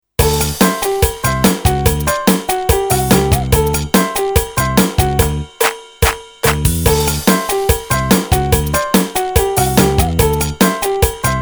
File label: Salsa; PlusFourSix